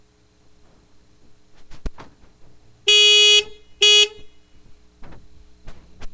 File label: Car horn